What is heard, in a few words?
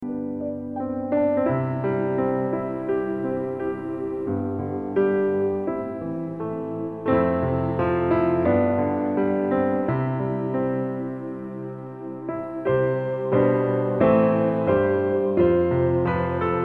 christmas piano